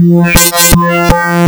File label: PPG wavetables; waveedit256